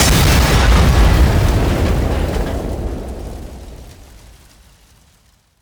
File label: Enemy Army Helicopter Crash; rampage arcade gamerip 2018